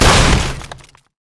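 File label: minefield explosion; LoL; ziggs snowday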